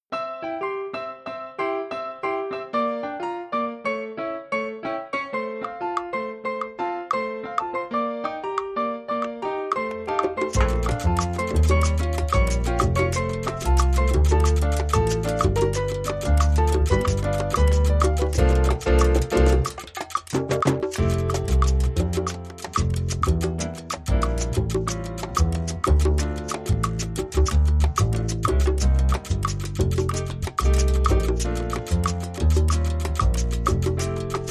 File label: Montuno No Melody